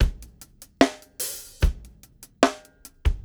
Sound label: 074 Pop Funky Dr Groove; Pop Rock; Drums; Slow Tempo; Digidesign; Pro Tools; Pro Tools Essential Loops